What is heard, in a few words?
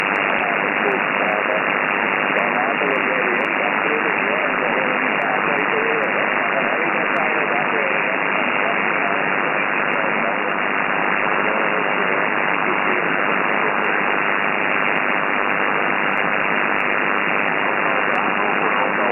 80m SSB low qrm day weakest parts; Bypass